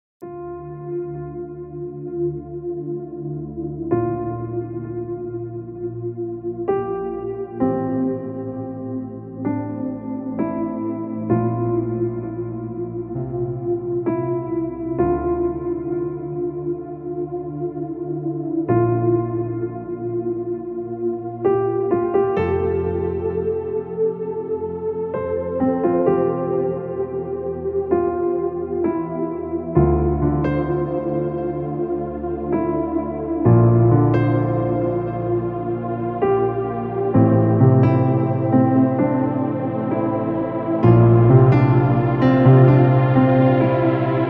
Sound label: remix